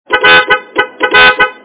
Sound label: ferrari f50 horn ringtone